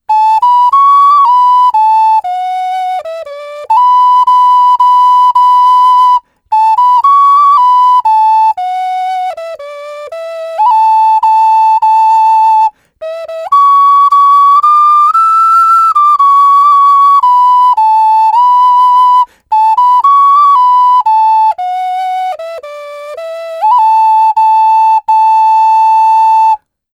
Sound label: Oc 6 hole, ocarina; When a knight won his spurs